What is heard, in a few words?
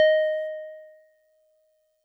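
DigiPianet DX100 D sharp 4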